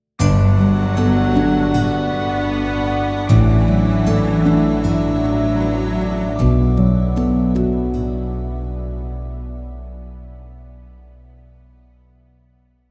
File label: Emotional Cinematic Ident